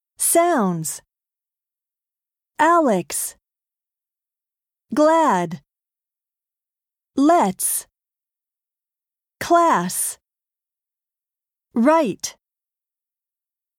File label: harmony; 新課程英語教科書 指導用音声CD